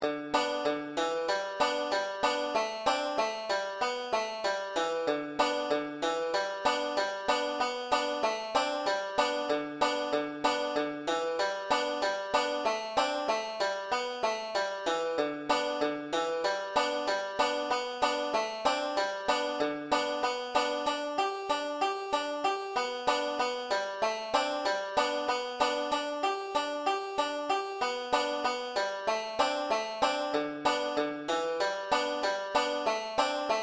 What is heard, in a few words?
g tuning solo, tenor banjo; brethren we have met